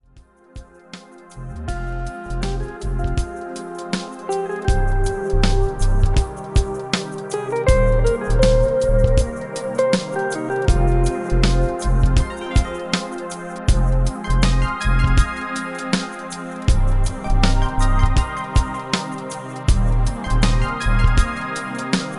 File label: Asian Chill